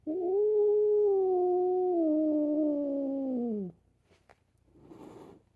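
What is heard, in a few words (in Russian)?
звук моего кота рычащего затем шипящего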